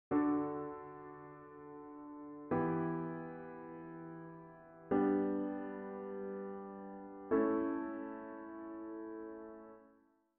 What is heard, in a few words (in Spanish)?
cadencia